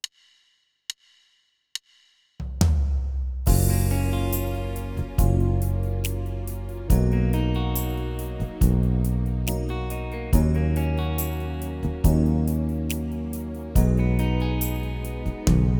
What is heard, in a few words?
style ballad 16beat ballad; yamaha tyros3